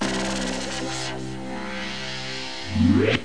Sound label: Disco Exclamation; discotheme